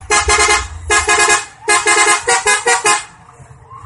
truck air horn

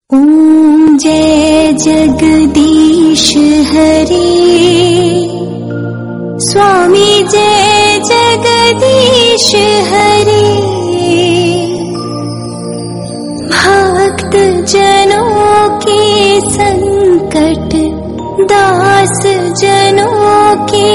om jai jagdish hare soft

